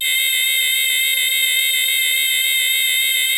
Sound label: PPG VOX C C6; DIG VOX; Music Store - Claudius Diemeter Schwerlos